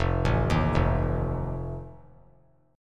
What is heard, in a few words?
F 7th F down; F7 arpeggiated